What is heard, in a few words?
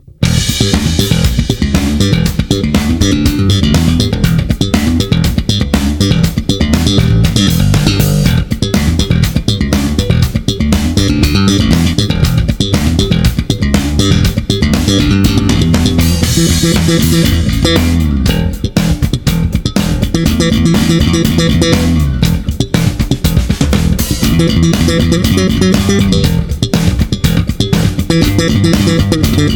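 slap funk, basso elettrico; Attiva a tre vie; Acero occhiolinato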